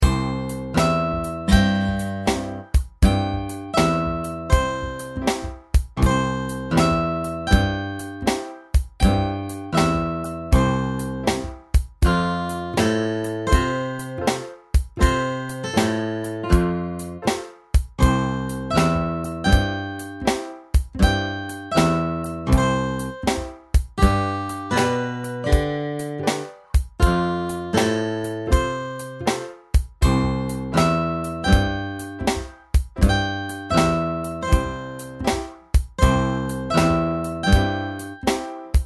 blues track with drums

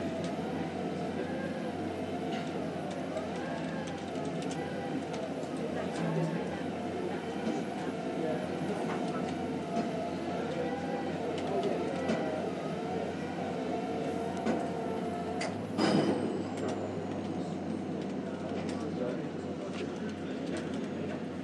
nice quiet friday afternoon in the office

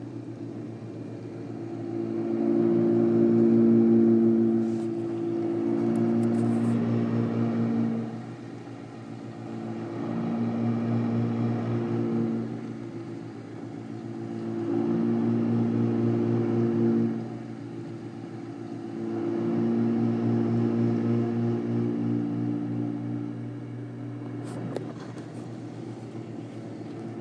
dishwasher

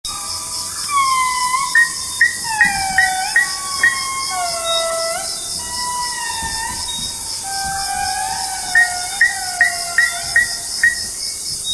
frogs and lemurs

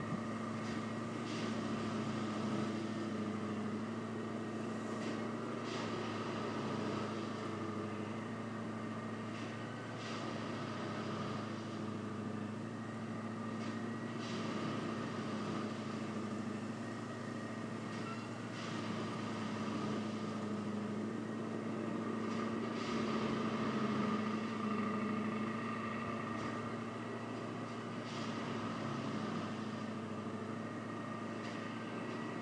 sounds outside our house tonight

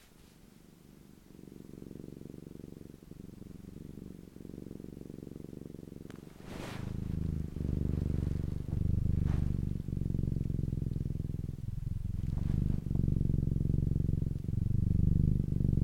purring

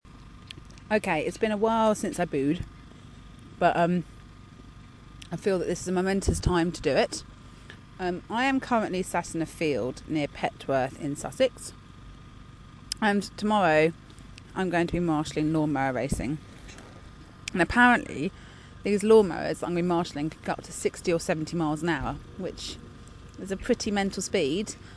lawnmower racing

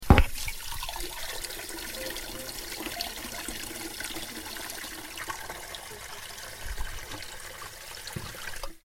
fill kettle

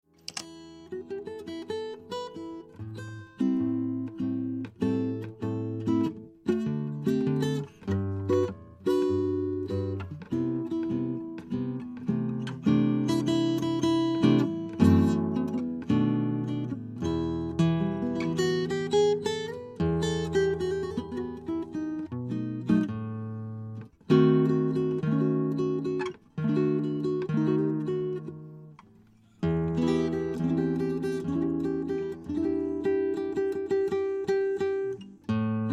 mic test classical guitar

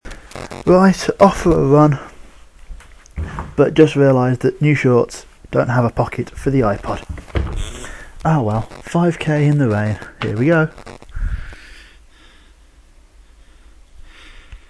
argh

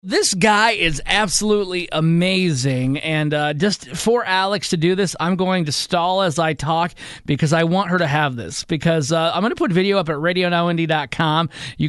man perfects police siren impression

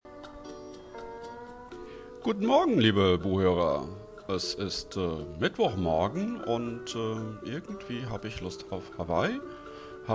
squeezebox duet; last fm hawaii

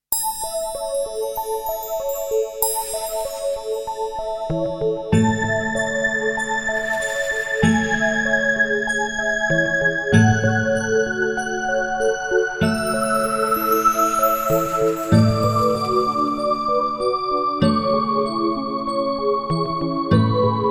violins autumn

